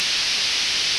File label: fuse; Koji the Frog 2.0.1